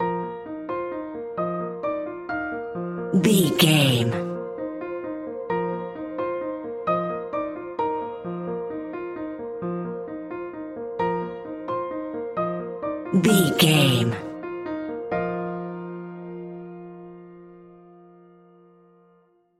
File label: wondrous tranquility bumper